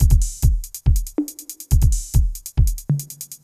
techno 140

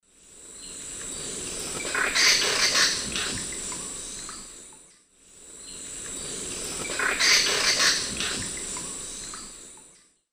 Capito aurovirens, alarm call; S. America; Eastern Lowlands

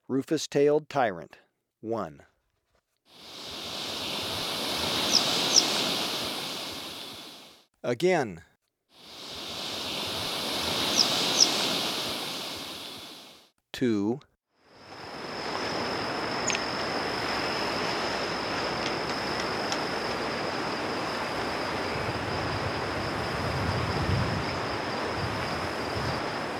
Rufous-tailed Tyrant, Birds of Ecuador; S. America

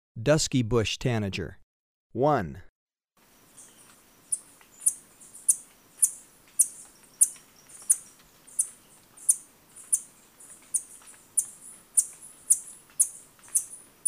Dusky Bush-Tanager, Birds of Ecuador; S. America

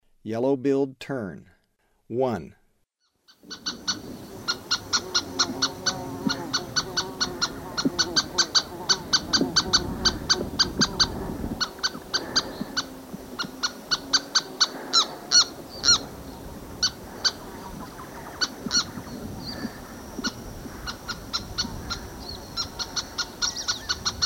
Yellow billed Tern, Birds of Ecuador; S. America

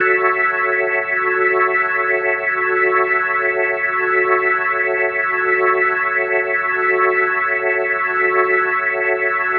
Organ F# Min7; The Vitale Organ; Minor 7

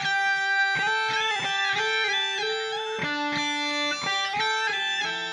Shift Delay, Guitars; Rock

